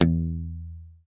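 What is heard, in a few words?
guitar, funk guitar, Strats, clean electric guitar; PYB Strats; Clean E.Gtr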